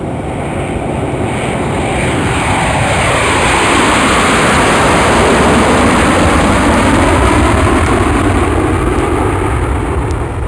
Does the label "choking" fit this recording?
No